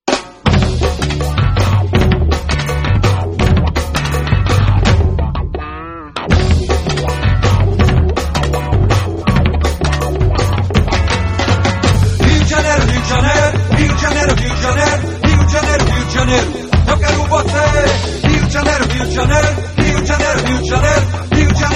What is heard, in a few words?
donkey beat